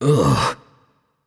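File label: nonverbal ugh; voicepack; smite; merlin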